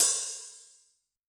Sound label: Open, HiHat; Yamaha DTXpress-II